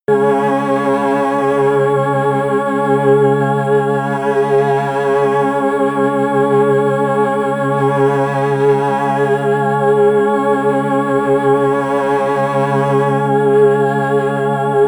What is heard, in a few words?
tense organ